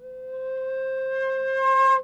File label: flute cresc